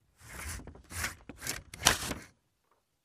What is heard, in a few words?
Wood Peeler